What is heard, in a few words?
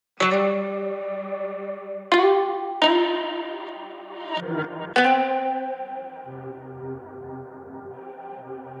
melody loop 85bpm F minor